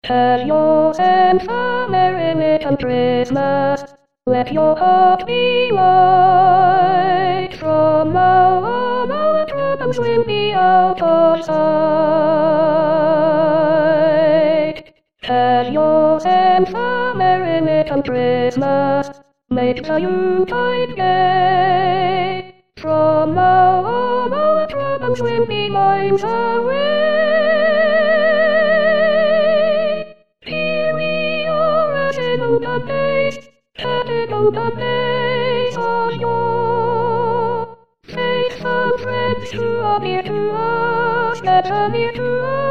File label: Soprano